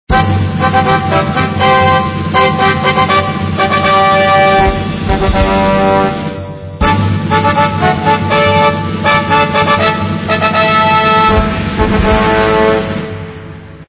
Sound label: FanFair